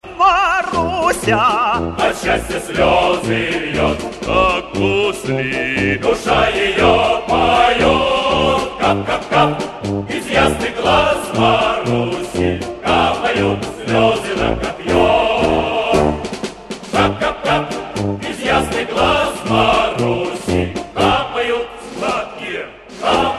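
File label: melody for the name Masha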